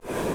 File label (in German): DIATON LUFT, AKKORDEON, Alpine Volksmusik; Best Service